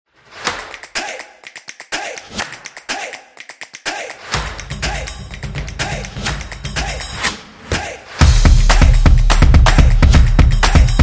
This Is Percussion Opener; casual game

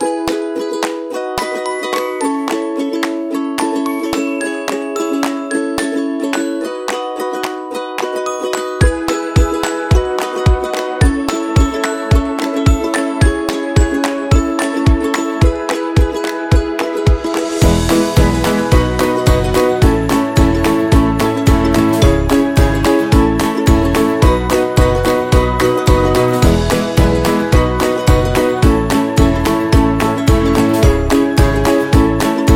Happy Birthday Upbeat Music; happy music vol 10

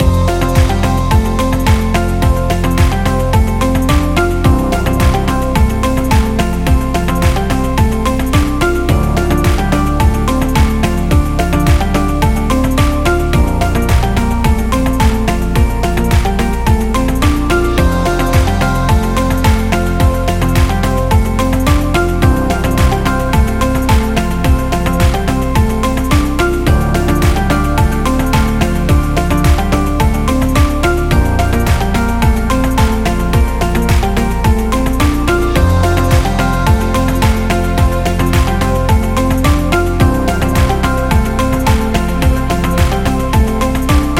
A Corporate Loop Upbeat; technology

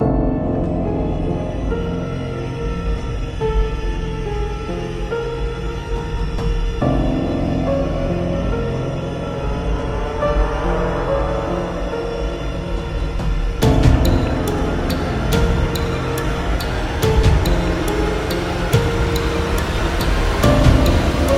Suspense

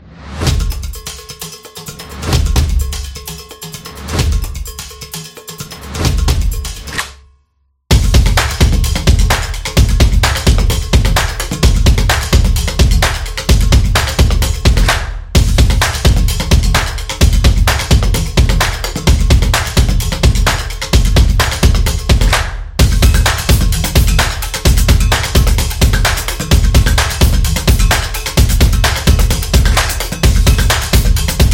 This Is Drums Beat Music; sports

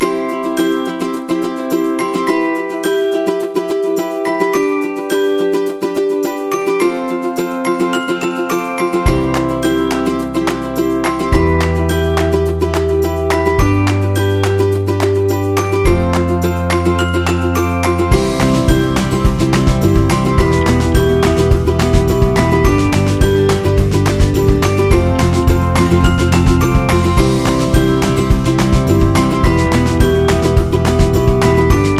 Happy Birthday, happy music; happy music vol 10

